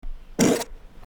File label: squeaky fart in public